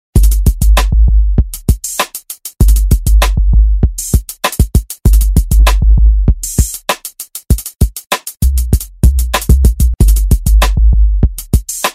Drum Loop Sound

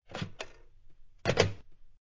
Phone Pick Up Hang Up

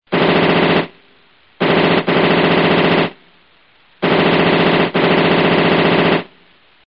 machine gun

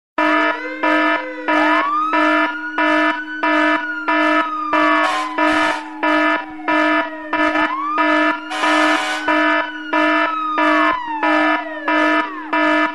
Alarm; Force

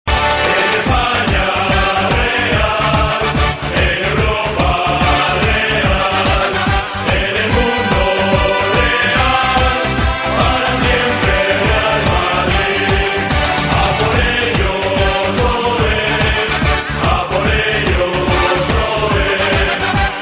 Sports Anthem

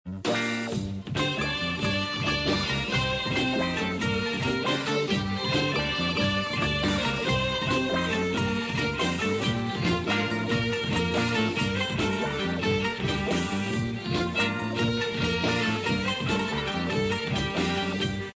Turkish Film Music

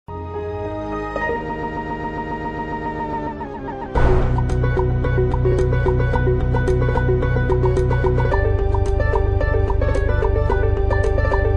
Windows Startup Music

